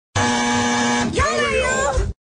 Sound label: jingle; 3fm